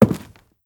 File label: Soft Wood Walk; moul